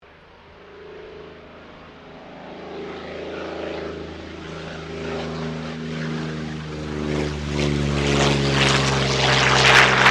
Aircraft P-40 Kittyhawk takeoff, WW2 Kittyhawk or Warhawk fighter plane; 1940s; Airplanes; Vehicles